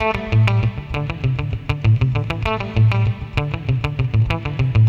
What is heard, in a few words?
guitar, stringed instruments; Sonic Foundry (Sony Creative Software)